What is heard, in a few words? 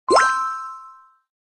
success; WIDESEA WMSClient